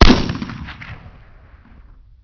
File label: M14 Single Shot, gun